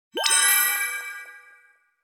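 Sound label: electronic kiran head; makoto; anemoi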